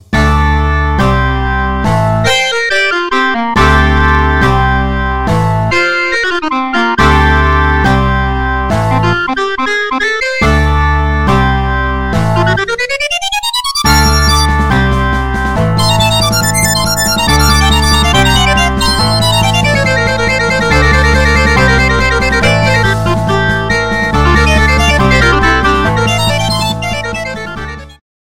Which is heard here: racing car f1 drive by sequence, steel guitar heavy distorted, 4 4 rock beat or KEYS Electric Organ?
KEYS Electric Organ